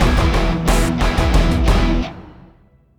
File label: Sting Battle; bakugan champions of vestroia 2020; switch gamerip